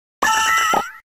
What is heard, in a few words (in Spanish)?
Grito; Cherrim; Gritos de Pokémon de la cuarta generación